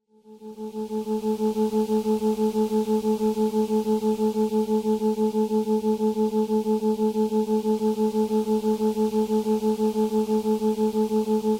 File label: theta binaural